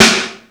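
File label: snare drum; Greytsounds; F2 SNARES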